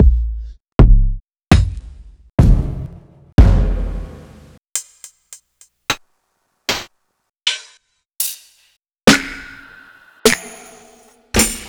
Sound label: Drum Kit Experimental; Nuttkase